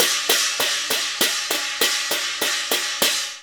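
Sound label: CHINA, China cymbal; Spectrasonics - Heart of Asia CD2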